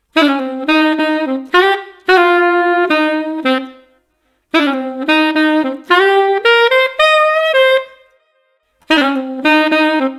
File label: Street Sax Bouncy 110